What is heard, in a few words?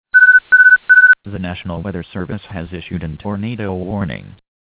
tornado warning